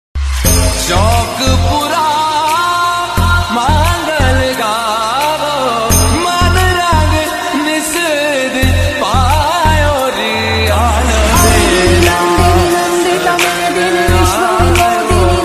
Aigiri Nandini Ringtone - New Bhakti Song Ringtone